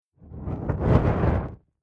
Whoosh Fireball Flame Ignits Burns From Right To Left And Outwards; Battle; Battle Sound Effects